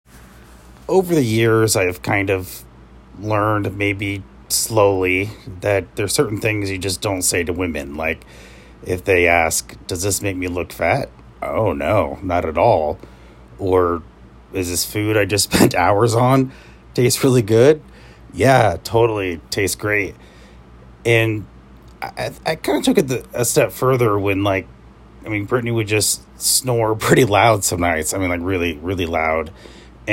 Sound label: Snore